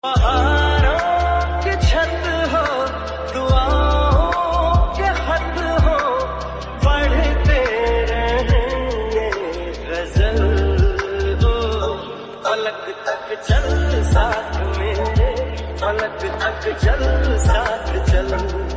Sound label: Lofi Remix